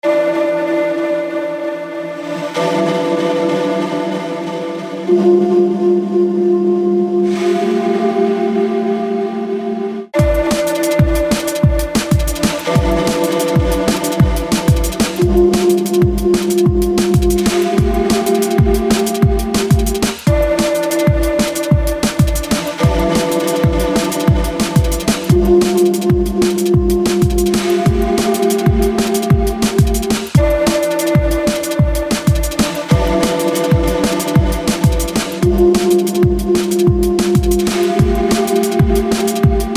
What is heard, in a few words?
Drum&Bass chill out sounds; wales; Proyecto de Drum&Bass 6